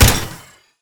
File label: kappa hit; smite; kuzenbo